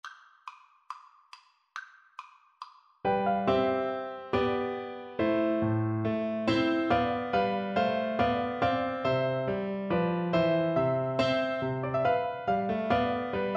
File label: bourree, Recorder